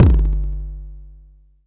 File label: LoFi blam; Loafa; Ableton Foundation FreePacks; Wicked Kits